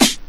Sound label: sparkly acoustic snare c key